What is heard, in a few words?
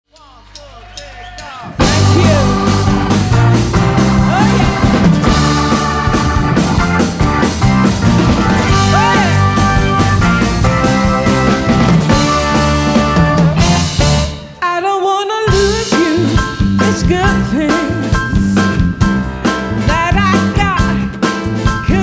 knock, live